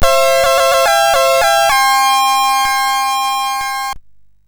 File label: sid MOS6581R2; Fiendish Freddys Big Top O Fun